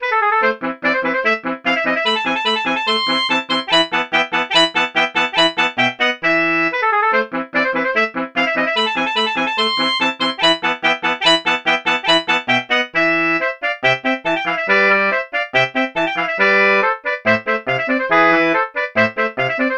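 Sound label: tenor saxophone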